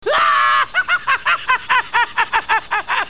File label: laugh; joker